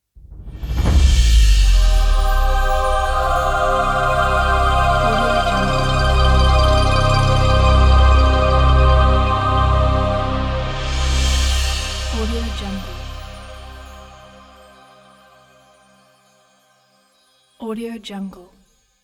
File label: epic orchestral choir opening